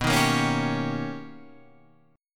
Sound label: B Augmented Major 9th B, chord; B+M9 Chord {7 6 8 6 8 9} strummed